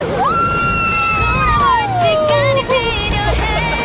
whooooo

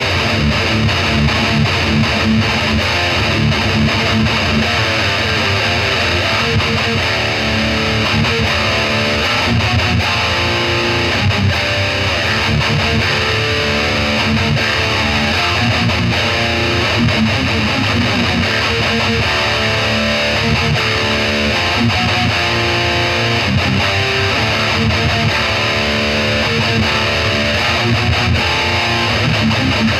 METAL

